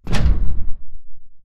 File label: footstep generic core; Titanfall 2